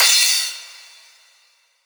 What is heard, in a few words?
FX Crash Crushed; M8